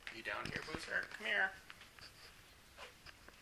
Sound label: dog bark; 2nd Floor